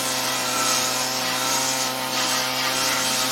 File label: Saw Cutting; Wulfrum